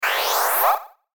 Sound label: Transition Ascend High Fast; Multimedia; Blastwave